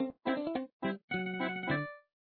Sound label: Customer Decent; papas cupcakeria online windows gamerip 2013